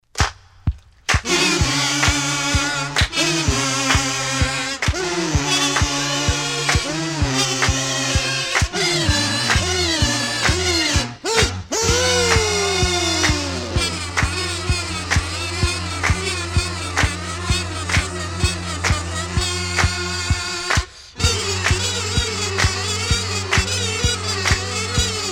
Kazoo Orchestra

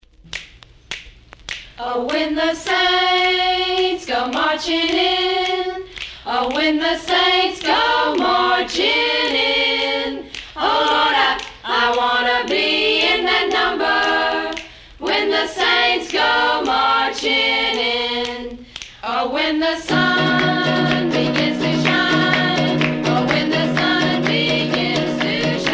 Washboard Band

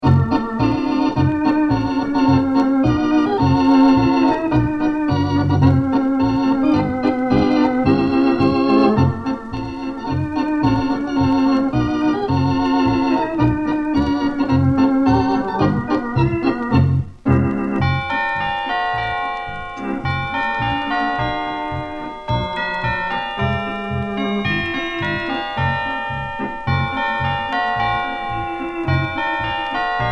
Jingle Bells; Special Ed Xmas

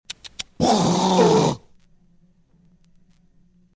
grindcore song

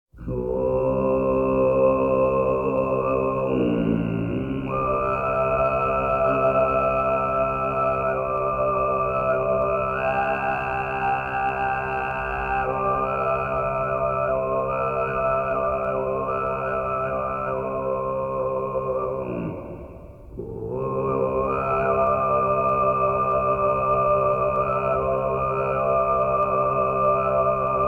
Demonstration of Kargyraa

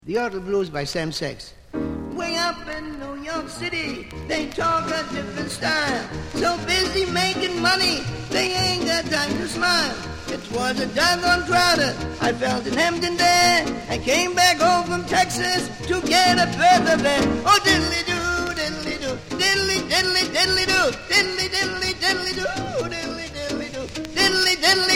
Yodel Blues

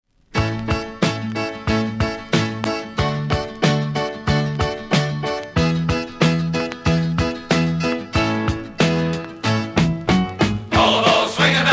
Australian Cricket Team Song

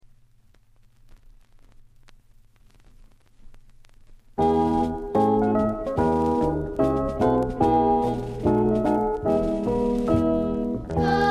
Choir

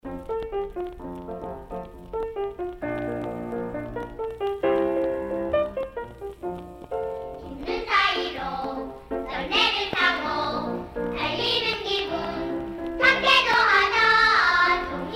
Korean children's choir, Jingle Bells

